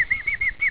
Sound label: ROBIN